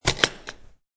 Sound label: Guitar Latch, Quick snap of metal latch on guitar case; Latches; Objects